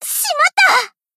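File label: attack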